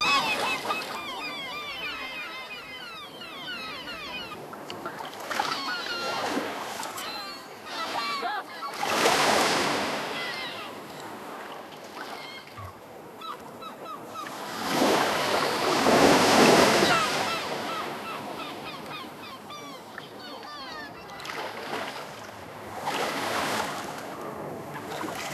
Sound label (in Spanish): gaviotas